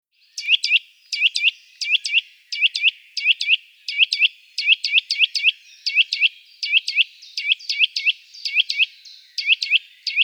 Sitta europaea

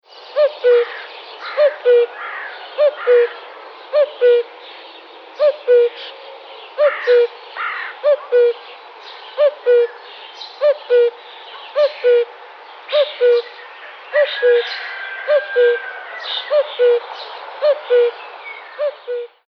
Cuculus canorus

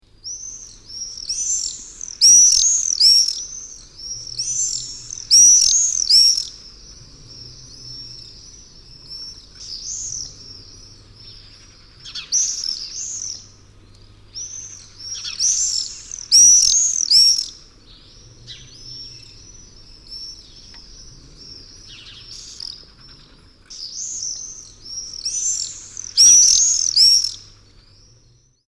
Apus apus

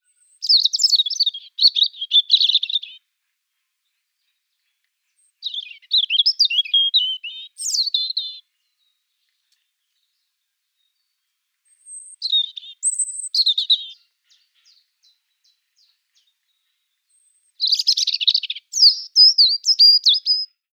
Erithacus rubecula

